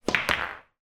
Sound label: sport pool snooker ball potted close range